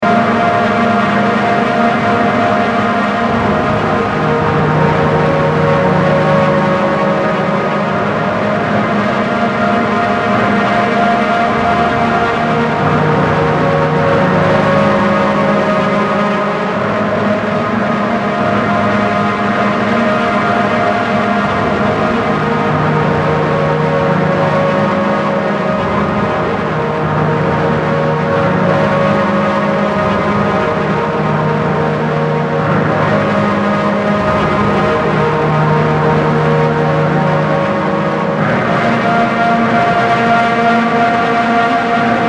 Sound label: Droning; Ukrainians compilation sampler